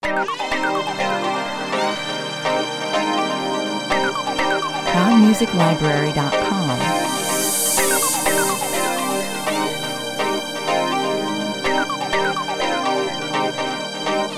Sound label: deepest two (bed) 124 bpm